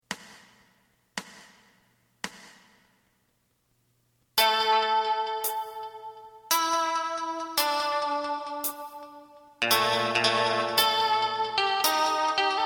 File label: drum